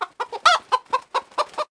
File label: Chicken